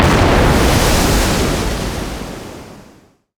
ship miss big effect; Hearts of Iron IV Death or Dishonor

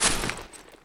chainlink, footsteps; Counter-Strike Global Offensive

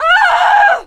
horrified, witch voice retreat; npc; Left 4 Dead 2

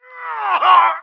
normal dying; Emperor - Battle for Dune

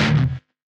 crunch; Frets on Fire

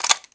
ump45 clip in, weapons; Counter-Strike Global Offensive; csgo

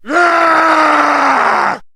man short yell; Mount&Blade Warband

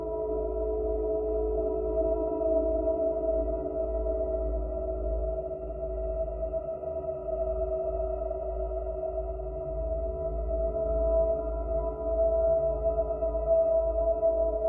drone; Left 4 Dead 2

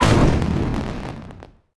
Firecracker Explode; Sid Meier's Civilization 4 Complete; Warlords; Units

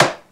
shield hit cut; Mount&Blade Warband

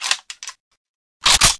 sub reload, weapon; AssaultCube

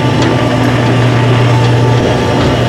squeek move

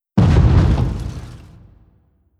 airplane bomb; Hearts of Iron IV Death or Dishonor

